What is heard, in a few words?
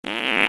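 crossbow fire; cstrike; weapons; xbow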